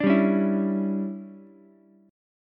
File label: D Major 7th Suspended 2nd Suspended 4th D down Ukulele; DM7sus2sus4; strummed; JGuitar